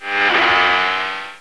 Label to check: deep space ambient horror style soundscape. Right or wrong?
Wrong